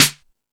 All Snares, E-MU Pro-Cussion

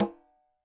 KILLER CONGA

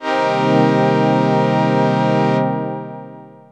syn orch, dx7

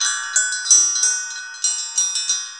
HAND BELL LOOP; Rarefaction; Poke in The Ear With A Sharp Stick

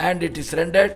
MAN VX, Indian Traditions; USB Soundscan vol.45; AKAI

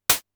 bright hh, Polivoks

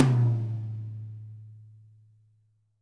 TOM, WET WHACKER; Spectrasonics; Burning Grooves CD2

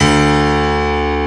GRAND MF D1, PIANO

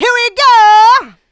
mixed vocals; Houseworx

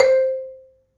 Marimba, C3; Terkelsen

